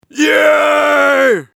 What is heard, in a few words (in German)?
vox schrei m